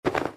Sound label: flap